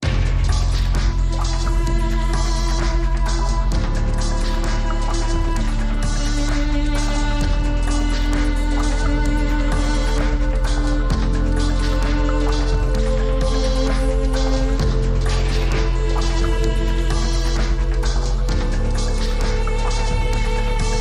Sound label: Dark Electronic